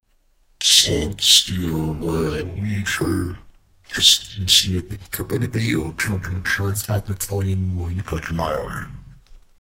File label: a happy goblin that just